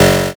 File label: zap